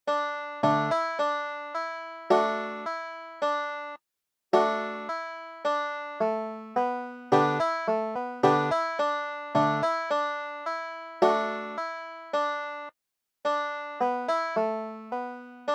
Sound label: sugar hill, banjo